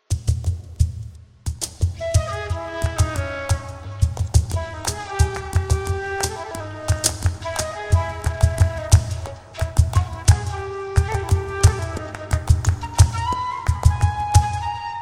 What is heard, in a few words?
tangos, flamenco flute